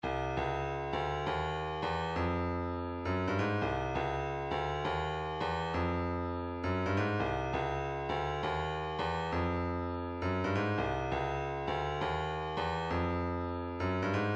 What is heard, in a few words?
kick, midi